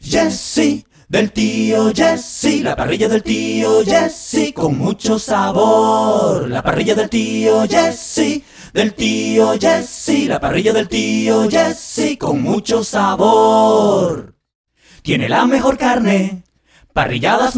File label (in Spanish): jingle voces; La Parrilla del tío Jessy 2021